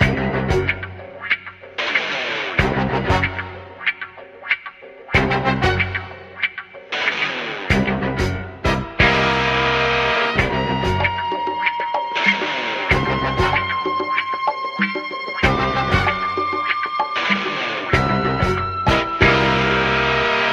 strings horns and guitar action adventure, loops, phonky